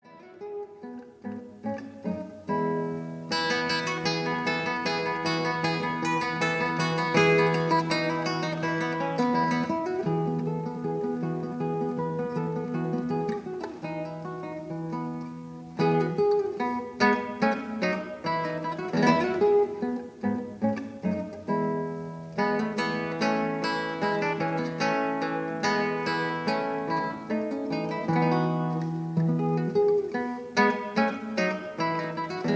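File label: Gavotte I II, guitar; SEGOVIA Collection; BACH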